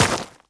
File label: step, footsteps player debris